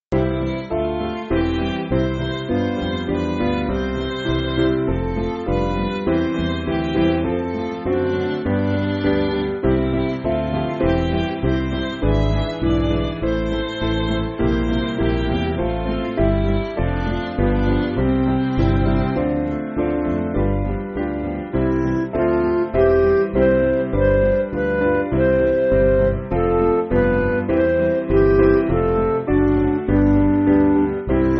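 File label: Midi, Music Backing Files for Churches; Father Lead Me; Supplication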